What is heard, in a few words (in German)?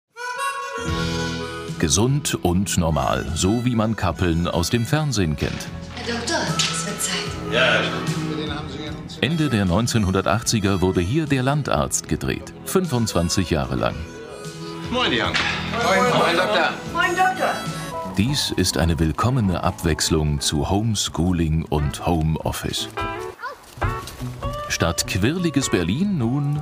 Doku entspannt